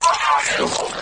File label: move, flood infection